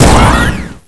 plasma rifle fire

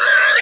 pig pain

